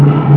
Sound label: carrera; Break